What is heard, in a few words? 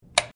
klick